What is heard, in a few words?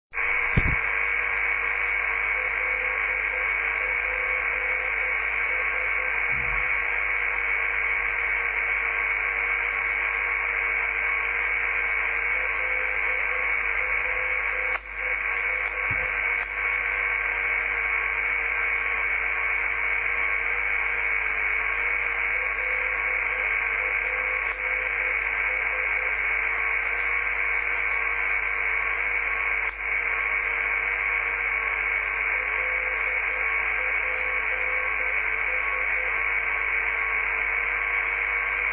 NDB, 403; LPS